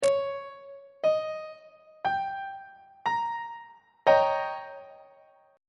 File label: d sharp dominant 7th 3rd inversion; on treble clef